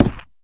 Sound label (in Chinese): 嘎枝之心放置声